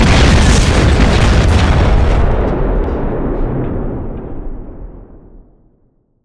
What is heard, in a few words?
Explosion Series